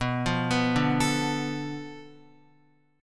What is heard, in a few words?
B-Minor 7th Flat 5th B down Guitar; Bm7b5 arpeggiated; 4F,4A,5C,5G,5C,6E; JGuitar